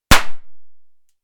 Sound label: Flobert